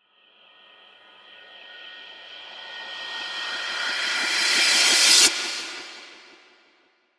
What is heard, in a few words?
Riser